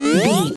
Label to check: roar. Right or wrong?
Wrong